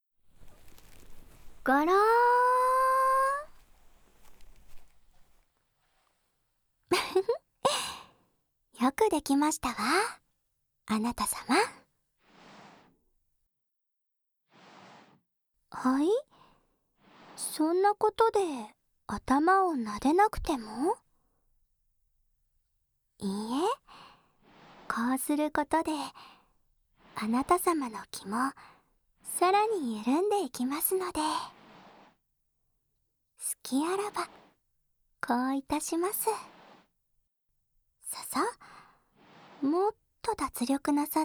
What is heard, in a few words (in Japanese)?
梵天竹耳かきで耳を整えましょう（右）